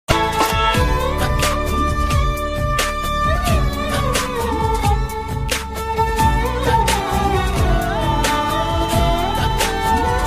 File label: love ringtone bgm; virata parvam; sai pallavi